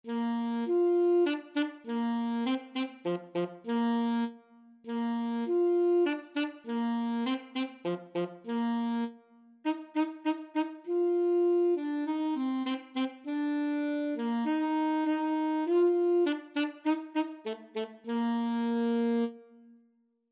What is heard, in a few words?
ASAX, saxophone